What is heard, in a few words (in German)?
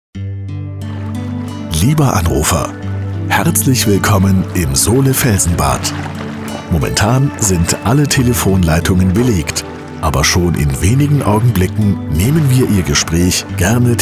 Warteschleife; Sole Felsen Bad